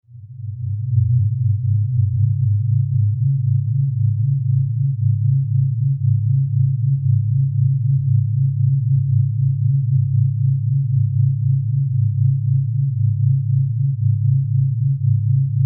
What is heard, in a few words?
Drone